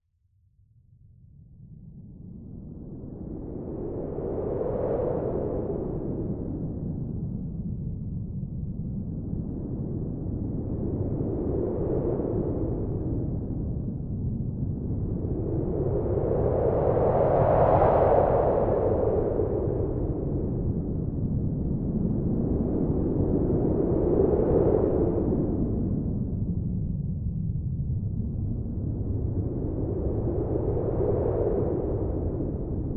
science fiction cold howling wind blizzard

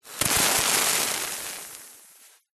horror acid burn; zapsplat

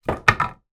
impact wood planks x2 drop on floor together

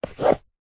household book slide out bookshelf; zapsplat

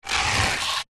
stone small scrape on concrete paving slab; zapsplat; foley

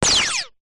Lazer Gun

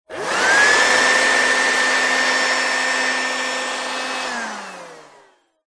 household vacuum hand held short operate

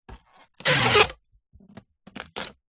office sticky tape gun apply tape to parcel

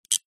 leisure toy plastic handcuffs lock

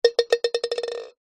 food egg drop into pan of water; zapsplat

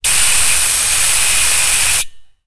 industrial gas cannister air release med burst

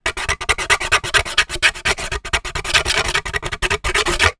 plastic thin phone cover scratch with knife; foley; zapsplat

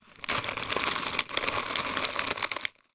plastic object roll scrape over gritty surface; foley; zapsplat

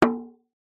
instrument drum small gamelan hit stick single

